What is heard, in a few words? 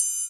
HH HH, Dance